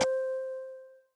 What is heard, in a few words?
receive news; World of Tanks Blitz